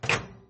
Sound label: Cooking